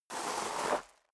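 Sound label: step dress boots gravel medium slide long, footsteps; schritte; menschen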